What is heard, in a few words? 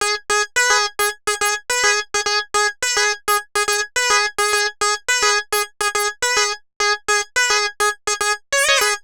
Funk FM; Sonic Foundry (Sony Creative Software); Club Joints and Street Anthems; Lions Den